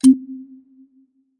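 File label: ui button press inactive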